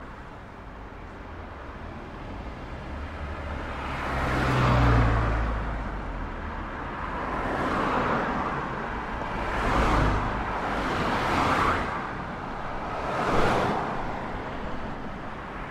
Traffic Car Sounds

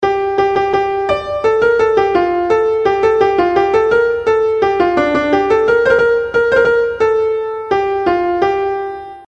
Guitar Tone

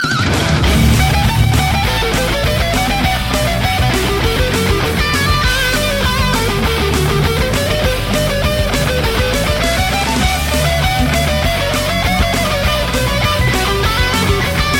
Canon D Rock

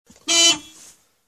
Geo Metro Horn

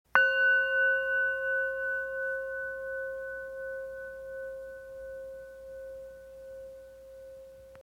Meditation Bowl

